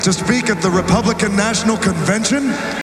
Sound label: rock